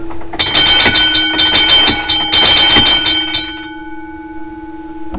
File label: centrifugal